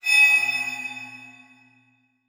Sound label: Multimedia Internet CD-Rom Flash High Pitch